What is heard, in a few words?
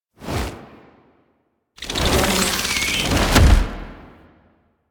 Rhino Deluxe Agile Akimbo; Warframe; Warframes; Idles Agile